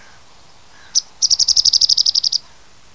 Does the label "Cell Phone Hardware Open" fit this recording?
No